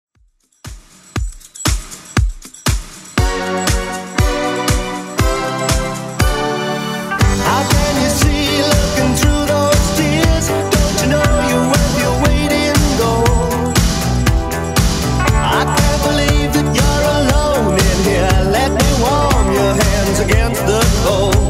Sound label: 80s Dance Redrum 119bpm